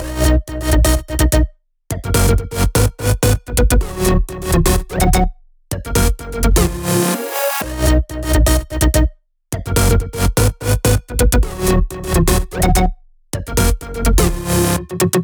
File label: 126BPM Melody; Vengeance Samples; Netherworld Kit